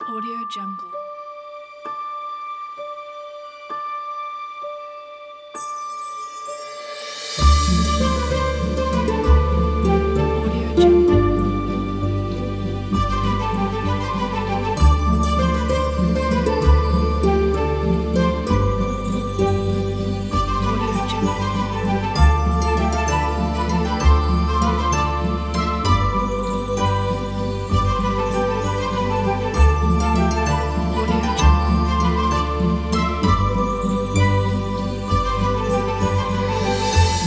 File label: fairytale village